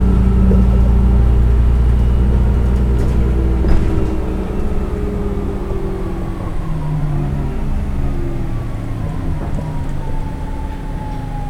arret a larret; place leclerc

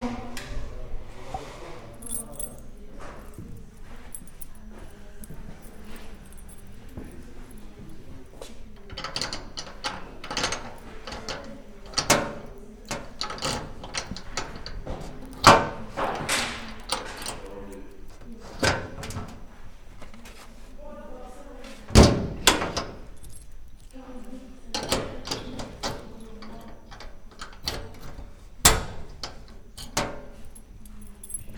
Ouverture casier; isba

